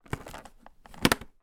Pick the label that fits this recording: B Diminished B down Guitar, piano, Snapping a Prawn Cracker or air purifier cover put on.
air purifier cover put on